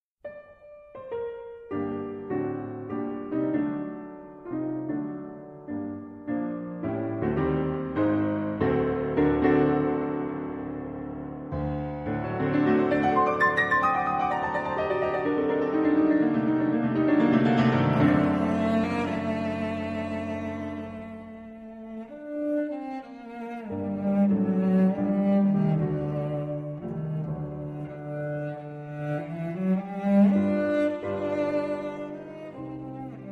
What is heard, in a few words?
Cello Sonata In G Minor Op 65 1 Allegro Moderato; Chopin